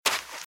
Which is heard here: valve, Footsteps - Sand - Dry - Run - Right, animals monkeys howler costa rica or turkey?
Footsteps - Sand - Dry - Run - Right